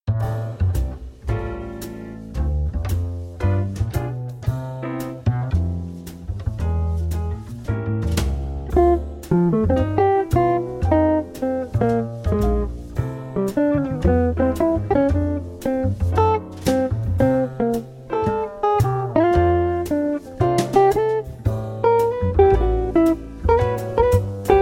Scattered Synth

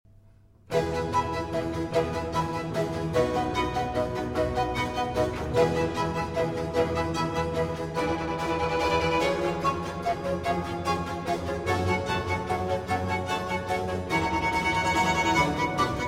Flute Concerto In G Minor

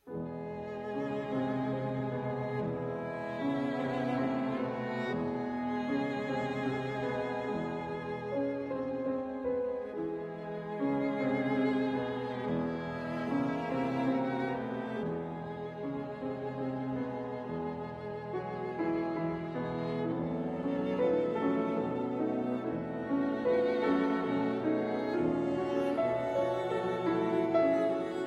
Piano Trio, Lento - Allegretto; Op. 29 III.

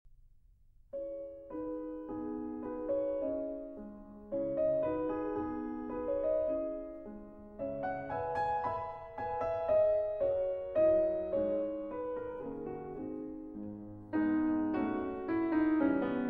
Menuet in C-Sharp Minor

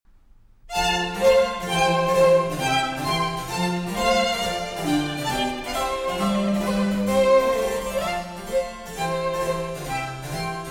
Trio Sonata in F for 2 Violins and Continuo

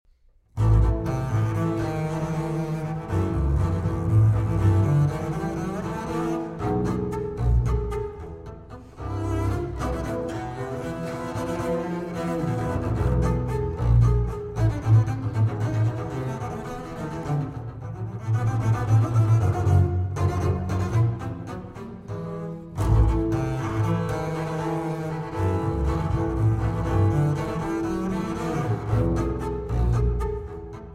Double Bass Duets

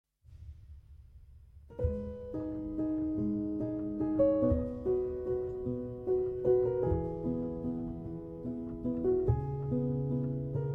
Waltz

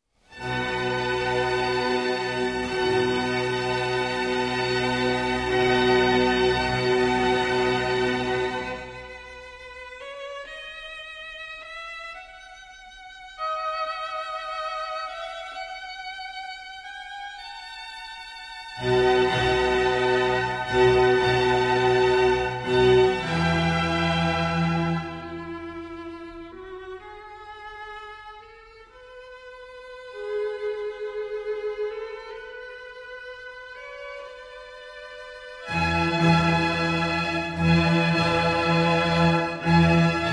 Concerto Grosso In B Minor

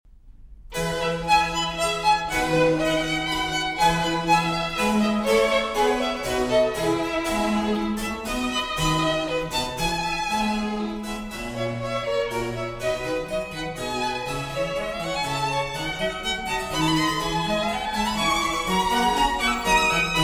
Trio Sonata in E for 2 Violins and Continuo

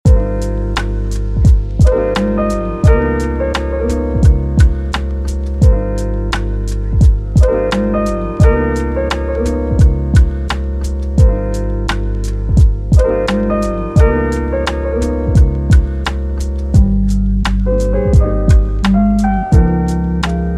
Brass

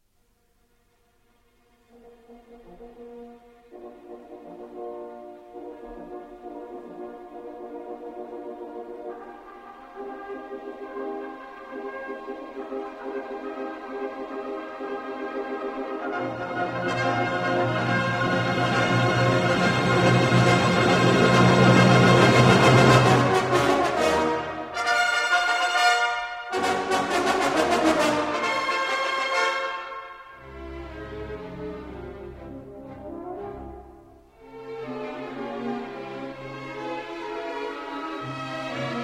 Symphony No. 4 in E Flat Major; Bruckner Symphony No. 4 in E Flat Major Romantic, WAB 104

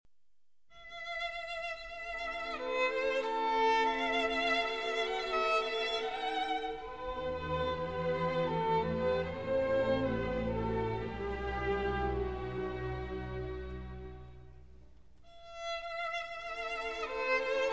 Violin Concerto in E Minor; Op. 64, MWV O 14; Mendelssohn

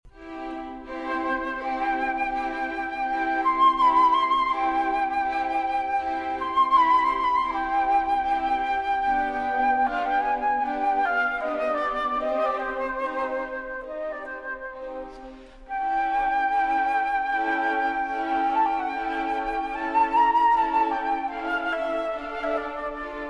Flute Concerto in G Minor

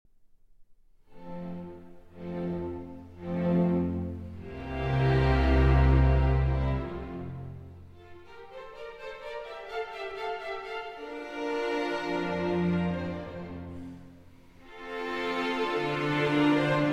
Concerto for Flute and Harp, Andantino; Mozart; K. 299

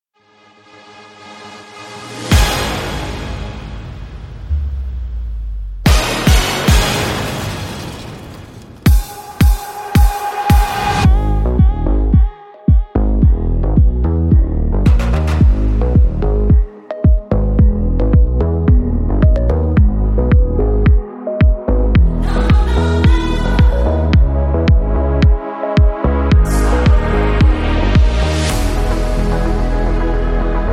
Karaoke Version

